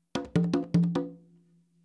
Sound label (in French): frise, congas